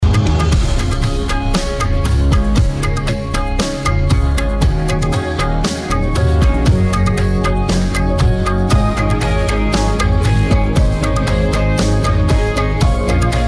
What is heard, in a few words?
Karaoke Song